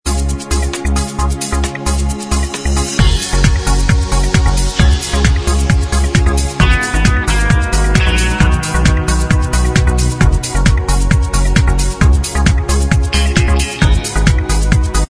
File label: Inst Track